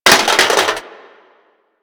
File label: Metal Damage, Impacts; Warframe